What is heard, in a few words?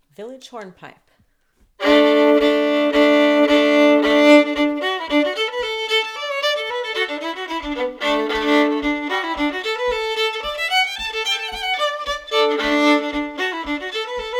Village Hornpipe